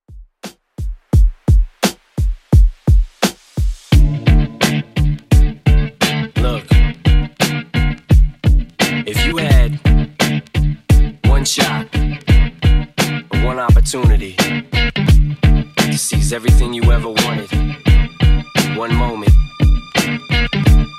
Hiphop DJ Friendly Redrum 86bpm

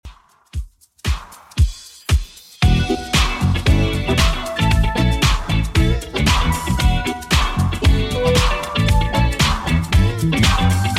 80s Redrum 115bpm

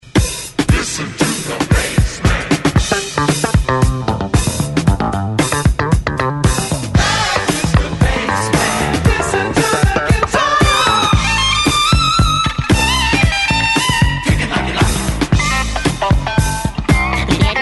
70s ReDrum 114 Bpm; DJDOLLS; remixplanet

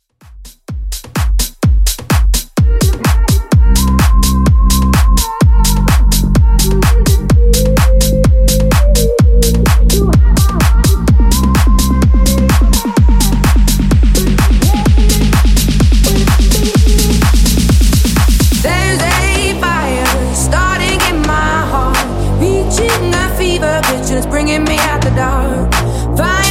Tech House 127bpm; Reworkz

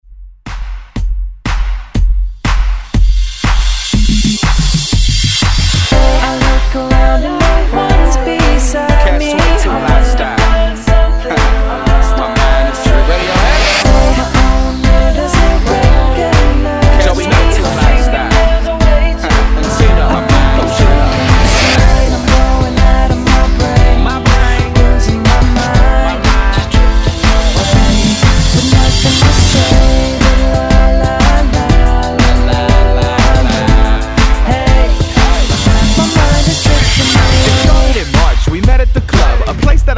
Re Drum 121Bpm; DJDOLLS

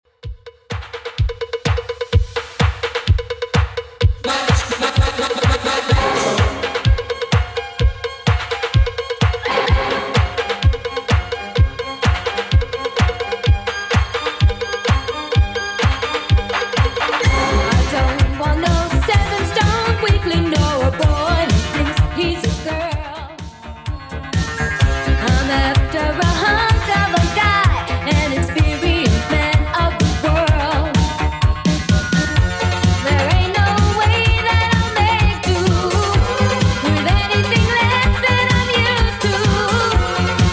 80s Redrum 127bpm

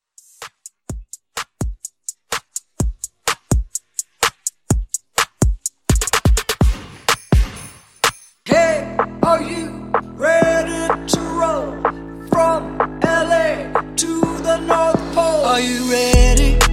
Xmas Redrum Intro; Remixfreakz

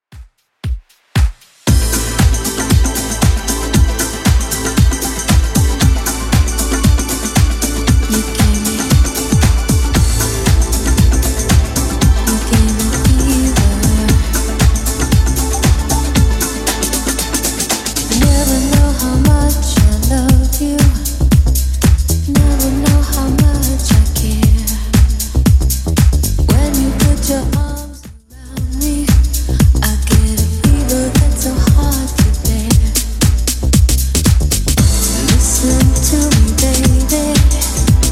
80s Redrum 116 bpm

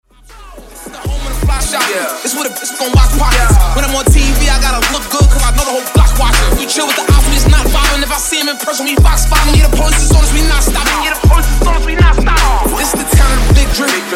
Wordplay Transition; 79 87Bpm

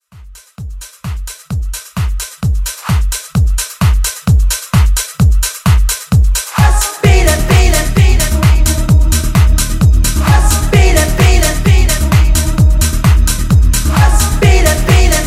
Mashup; Remixfreakz

